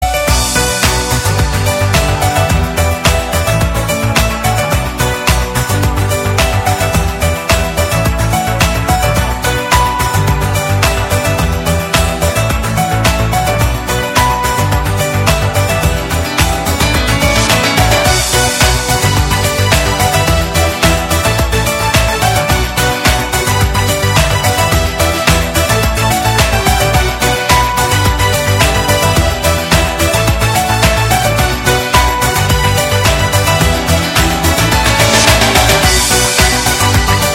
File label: instrumental